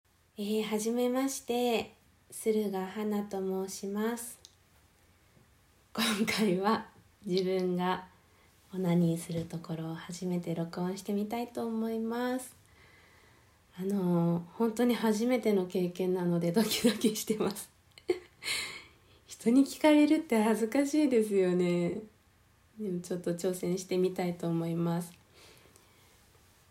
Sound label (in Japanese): 初 ガチ 実演 オナニー, 大絶叫